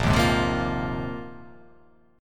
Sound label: Bb 9th Suspended 4th Bb down, Bb9sus4 chord; strummed; JGuitar